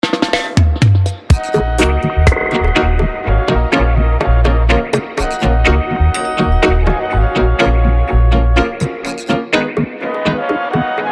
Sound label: Dub